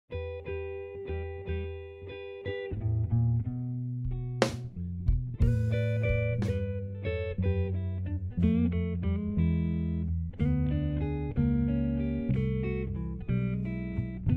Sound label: Delta Traditional Slow Blues play along